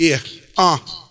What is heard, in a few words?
yeah uh looped